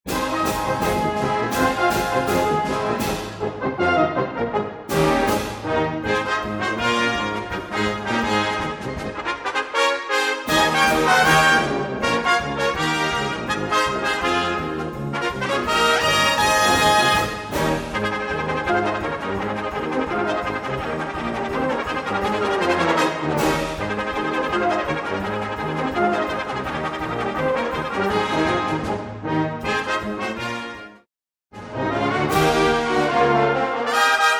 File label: Trumpets; Trumpets on tour